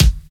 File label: rich high end bass drum sample a key; kick drum samples